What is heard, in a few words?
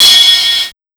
HRD 130 RIDE, Big Beat Drumloops; USB Soundscan vol.25; AKAI; HARD 130